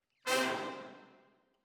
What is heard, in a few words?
ORTF, Jazz for piano